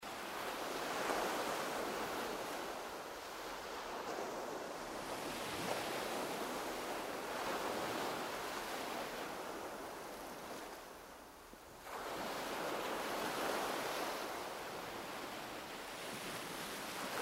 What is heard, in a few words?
bg layer; Wacky Waves